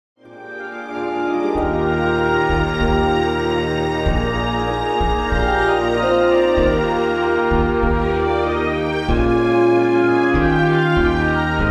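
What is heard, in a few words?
karaoke instrumental; princess; disney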